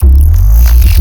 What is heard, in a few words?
Glitchy bass growl